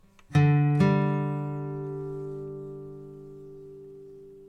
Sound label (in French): Sol mineur, guitare